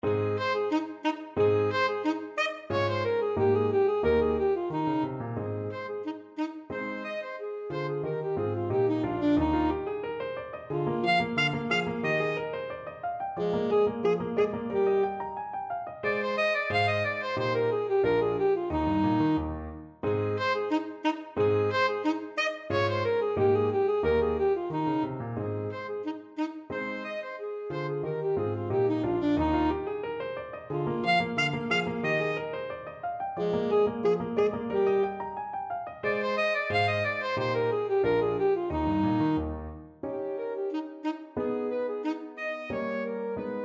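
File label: clementi sonatina 1, alto saxophone; op 36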